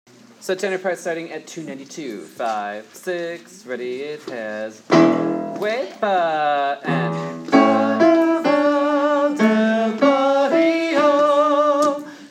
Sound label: carnaval tenor